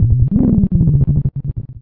scanner alert; Source Engine; video game; npc

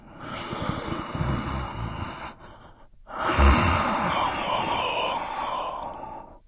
breathing, infected; npc; idle; source engine; left 4 dead 2

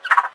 jungle restore up; windows 9x; sound schemes

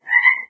frog, frog groups; ambient; random animals; Left 4 Dead 2; Source Engine

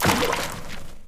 paint blob splat

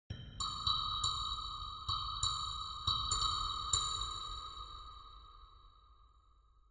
ambient synth grain click

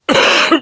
cough, survivor voice; source engine; left 4 dead 2; namvet

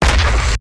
giant heavy step; source engine; tf; mvm; video games

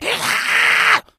rage at victim, infected, male

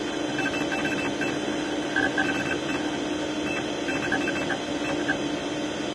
computer working, ambient; Source Engine; tf

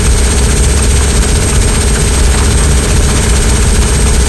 grinder loop; Source Engine; tf

